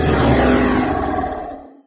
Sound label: hoverbike nitro; There; ThereClient